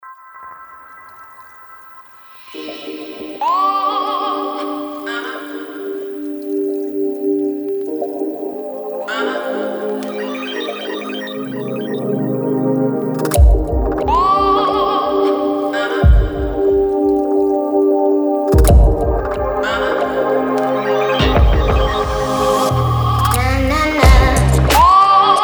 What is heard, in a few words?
Electronic